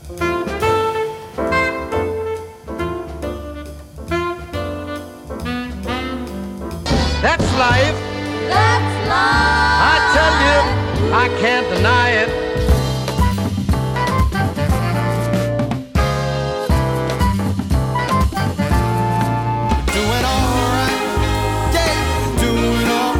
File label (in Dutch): JAZZ STREAMS COMPILATIE